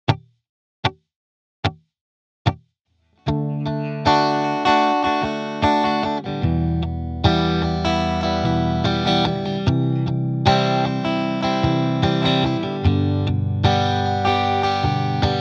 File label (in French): Rythmique; Les doubles croches; Les rythmiques II